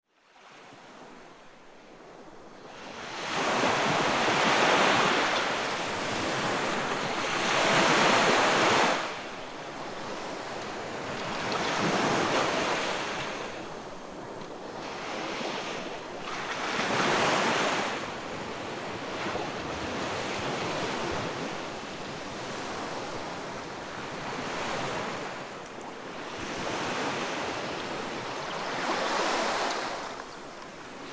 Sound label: ocean sounds